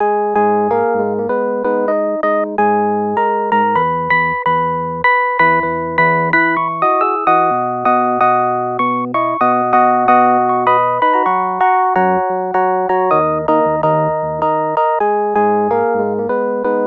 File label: Converted Synth Drum